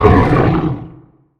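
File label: Final boss damage up, monster; Balierd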